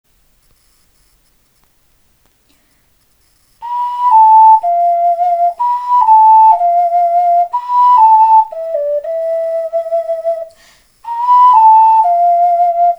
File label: ocarina; elf